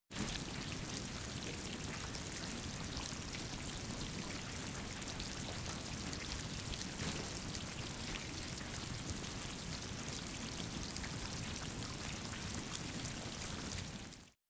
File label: wet textures, ambient or nature